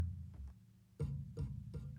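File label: Calm Far, music; from dust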